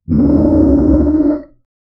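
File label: deep guttural demonic death, warrior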